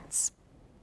Birds

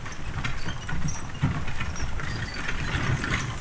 Horse Drawn Wagon; Electa Quinney

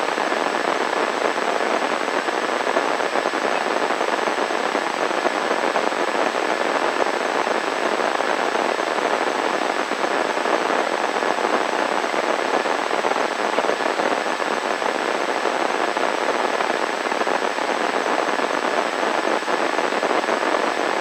Static White Noise Basic; Poetry Project

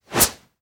Whoosh, Quick, Short, Airy, Sharp; Universal SFX

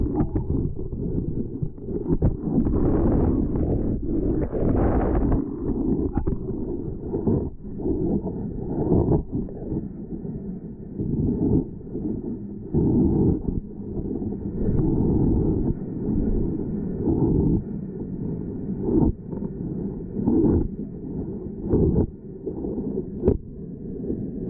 Underwater Ice saw, Ice Fishing; The Ways - Ice Fishing; Nat Sound Selects

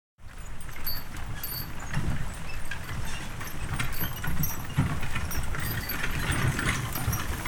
Horse Drawn Wagon; Electa Quinney

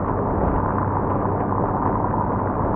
Car Road Noise; Poetry Project

